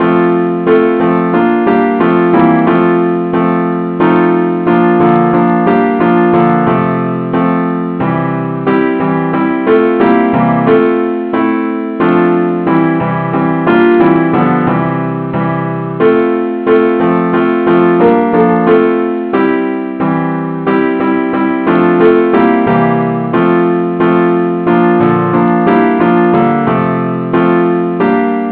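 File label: advance accompaniment key f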